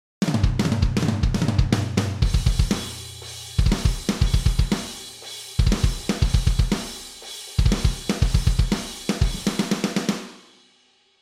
drums none; multiband

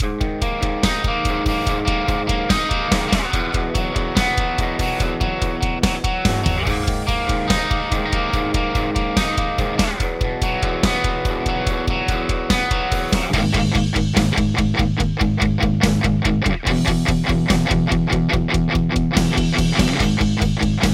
GTR Over Drive; ts shootout

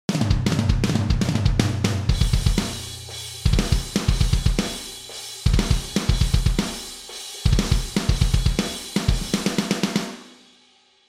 drums comp

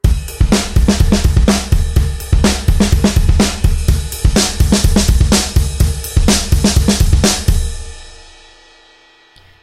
high shelf

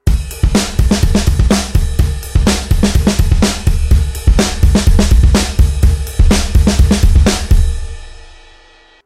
low shelf

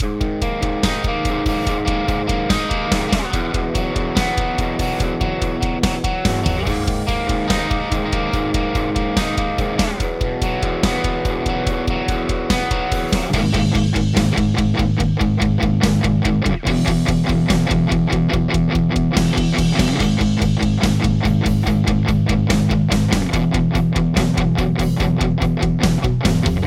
Guitar Rig Skreamer; ts shootout

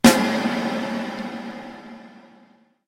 Church

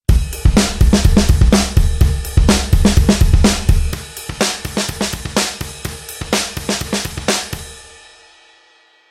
hpf

